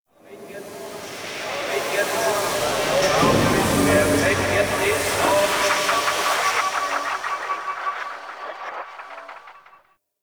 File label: Ghost Cargo Echoes, ambience; Warframe; TheNewWar; Zariman